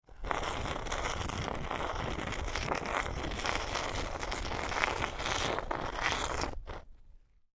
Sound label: Stone Grind Scrape Along Stone Pillar Long; A rock scrapes against the side of a smooth rock pillar